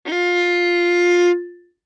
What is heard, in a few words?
Violin arco ff sul G F4; MIS Pitches - 2014; Strings